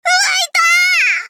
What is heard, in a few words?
combo break; vv idke trail